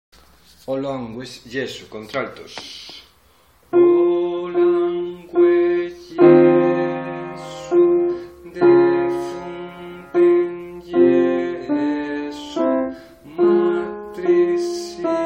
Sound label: alto